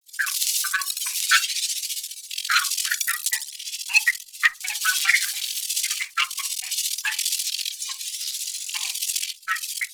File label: Hack Cursor Loop; Warframe; The New War; Narmer Hack